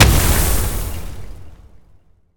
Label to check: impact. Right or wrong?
Right